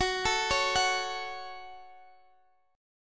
Gb Minor Gb, down; Gbm arpeggiated

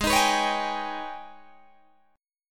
Ab Minor Major 11th Ab, Guitar Open G

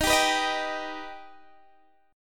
D sharp Augmented D sharp, Guitar, Open D

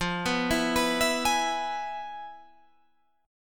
F Minor Major 7th Flat 5th Guitar Drop D

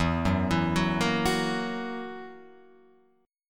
E Suspended 2nd Flat 5th E down Guitar Open F; arpeggiated; JGuitar

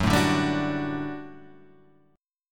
G Major 7th Suspended 2nd Gb

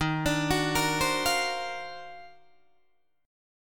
Eb Minor Major 13th, Guitar, DADGAD; EbmM13; arpeggiated

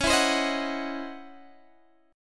C sharp Minor Major 11th, Guitar, Drop D

